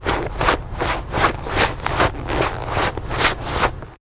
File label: run foley loop